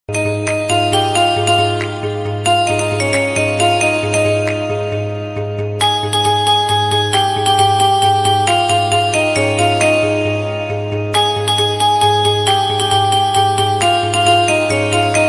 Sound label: song tune mobail ringtone; aai akvira